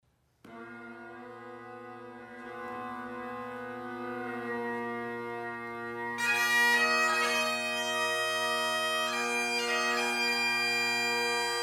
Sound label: instrumental Amazing Grace